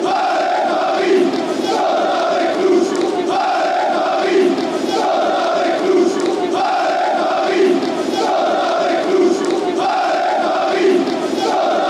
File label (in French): allez paris chante avec nous, chants